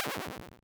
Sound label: player death